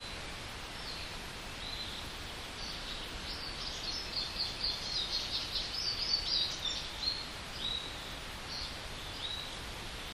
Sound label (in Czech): Cizek Lesni; poznvme bory